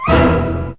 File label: orch, fairligh